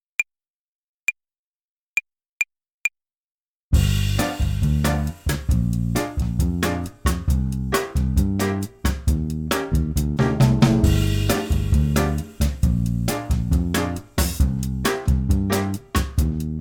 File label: Play-along, Jazz Club Altsaxophon